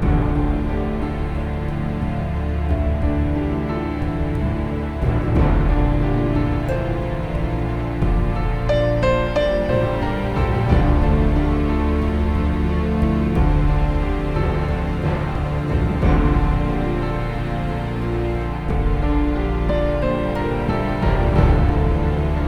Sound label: Castle Low Intensity